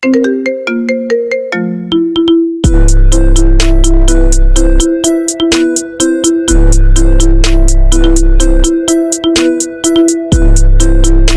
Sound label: marimba